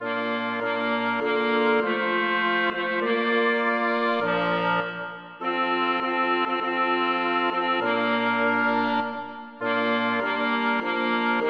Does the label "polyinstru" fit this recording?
Yes